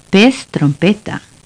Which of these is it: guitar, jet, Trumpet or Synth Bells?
Trumpet